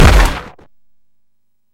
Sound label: damage hit; freepixel; WordJourney